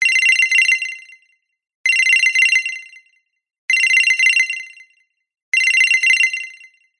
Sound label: multimedia ringtone modern digital smartphone